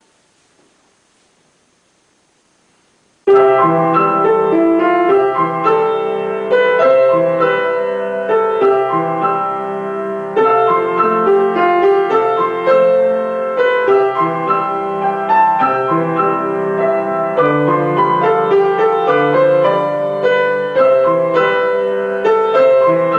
Romantischer Walzer, klavier

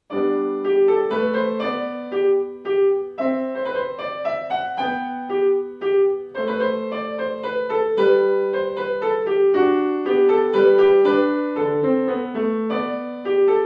Menuett G-Dur; Bach

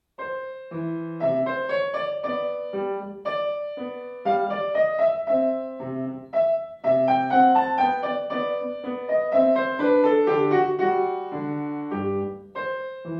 Menuett C-Dur; W. A. Mozart

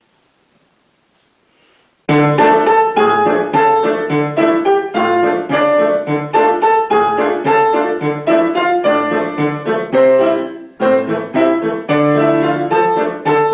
Tanz im 7-Viertel-Takt, klavier

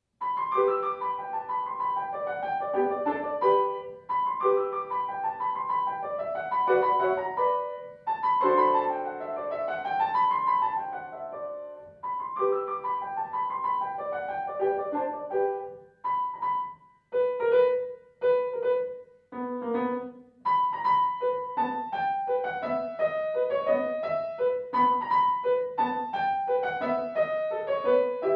Die Spieluhr, Klavier; Gurlitt

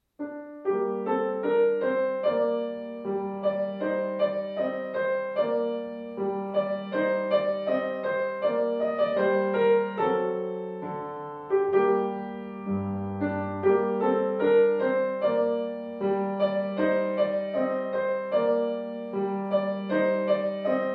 Altfranzoesische Lied, klavier

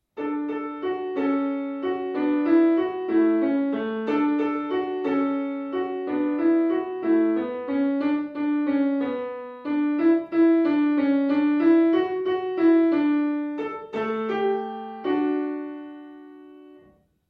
Melodie fuer Kinder, klavier

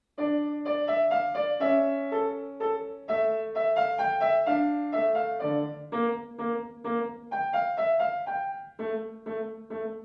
Menuett d-moll, Klavier; L. Mozart

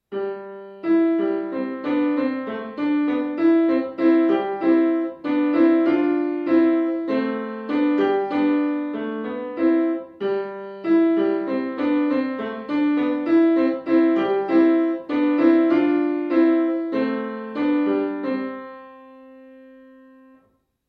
Wanderlied, klavier

